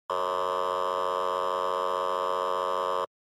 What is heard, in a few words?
Police Car Siren Dry Buzzer